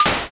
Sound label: egg crack